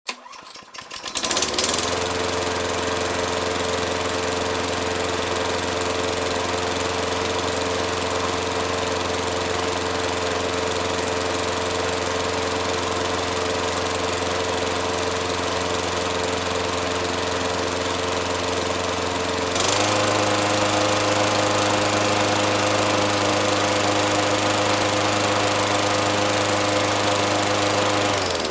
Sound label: Lawn Mower: Start And Steady Motor Noise.